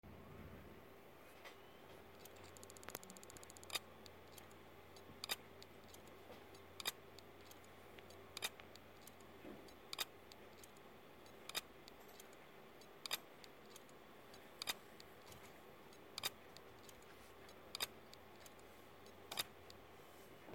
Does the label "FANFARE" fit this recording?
No